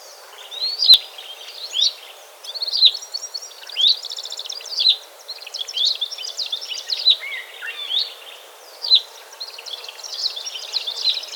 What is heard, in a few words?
Plain-backed Pipit; African birds